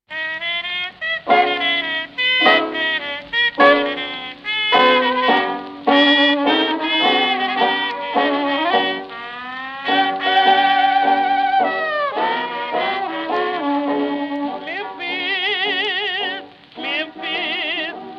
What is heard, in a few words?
E Flat Blues